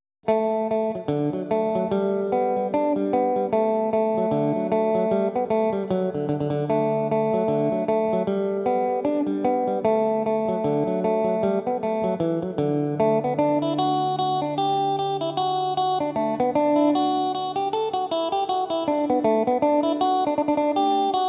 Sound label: tenorcaster, mandolin